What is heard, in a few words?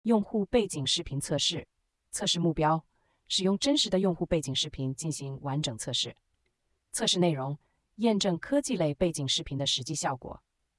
background